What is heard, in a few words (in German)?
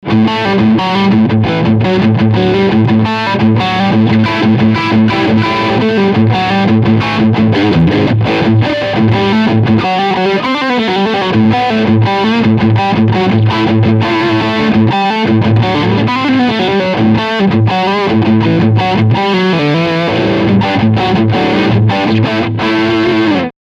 Riff Overdrive Steg SC